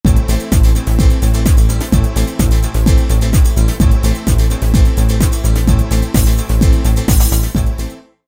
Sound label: House, Roland D2